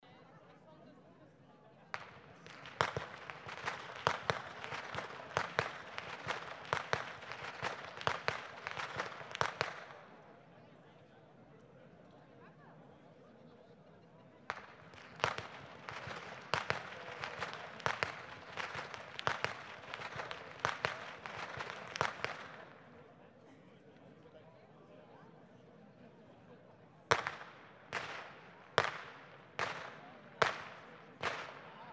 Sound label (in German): schnalzen, jugend; waging